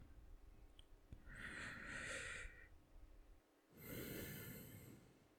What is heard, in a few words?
Inhale Exhale